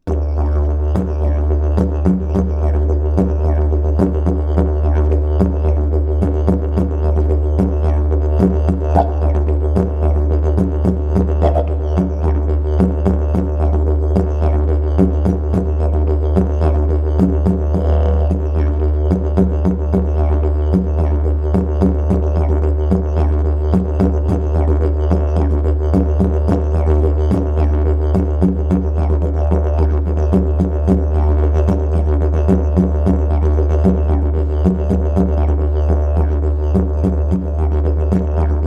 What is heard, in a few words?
didge